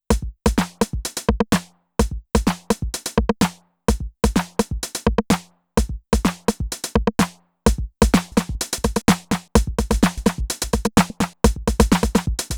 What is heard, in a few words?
dj 505 Shredder Flanger Slide Delay